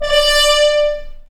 BRS F.HRN, BRS_FHns Mutes; Roland LCDP06 Brass Sections; F.Horns FX+

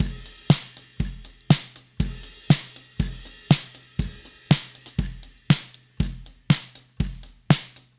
Drums Crash, 70s Analog Funk; Sonic Foundry (Sony Creative Software); What it Is! 70s Analog Funk

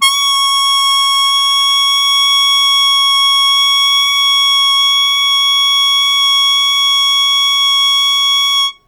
Loud Attack Sustain C#2, Harmonica; Zero G - Harmonica

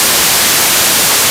WHITE NOISE; Kid Nepro; Elite

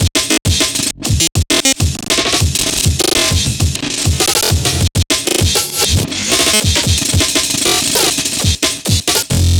bpm200 breakbeat

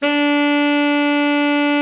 TENOR, tenor sax; Best Service; Killer Horns

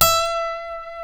F#, funk guitars; Spectrasonics; Hans Zimmer Guitars VOL-1; TAKA FUNK

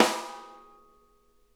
SNARE EDG; Sonic Reality; Interactive Drum Kits

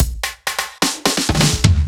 Fills 128BPM, Clubsounds

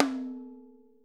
ROTOTOMS; Sonic Reality - Vintage Timetraveler

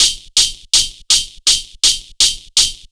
HH LOOP; USB Soundscan vol.27

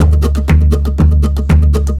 120 PERC; Best Service Advanced Media Trax Modern Composer

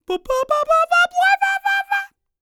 scat, HUMAN TRPT, Jazz FX; Spectrasonics; Vocal Planet

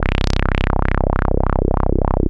WAH WAH C1; Analogon

